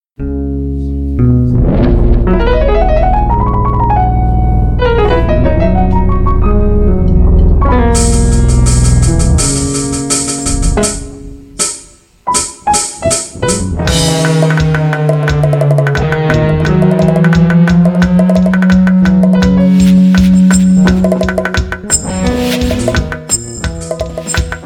Rain Song

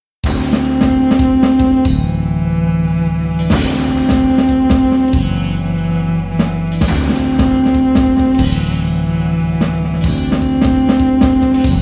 Rainy

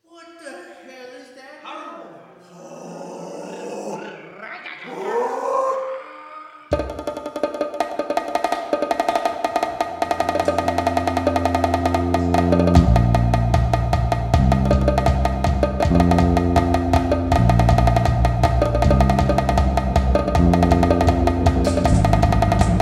Hell Noise

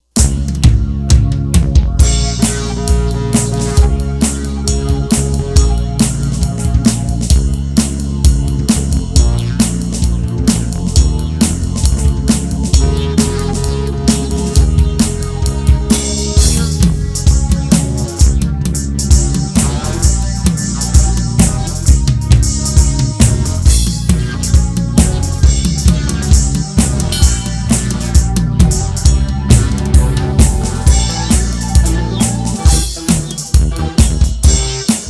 Random Noises

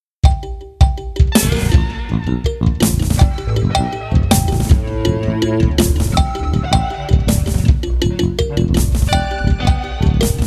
Rain No Thunder

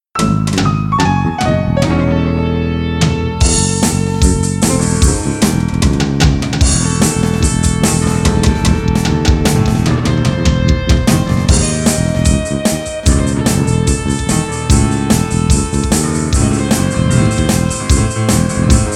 Engine Light

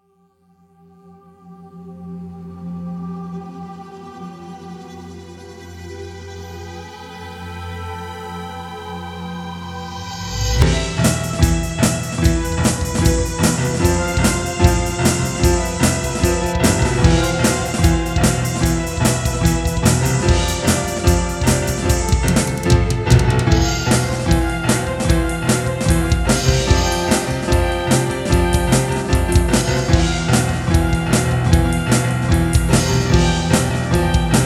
Thunder or Boom

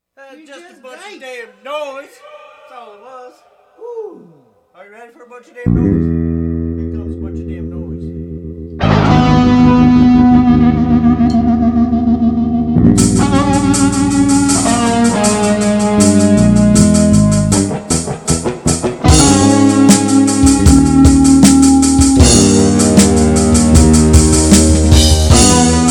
Damned Noise

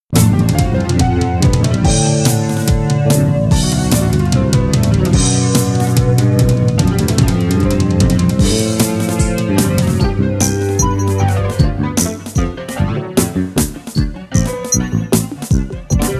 Little Birds

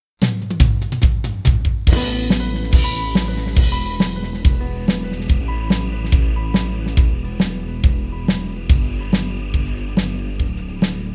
Crickets

